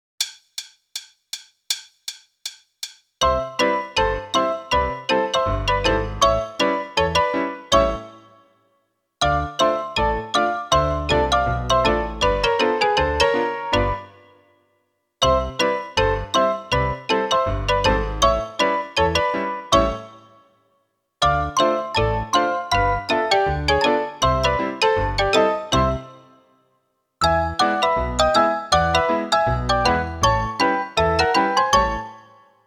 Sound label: Solo Foxtrot; Meespeel CD